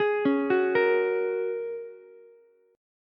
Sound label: A sharp Minor 7th Sharp 5th, Ukulele; arpeggiated; 13,13,14,13; JGuitar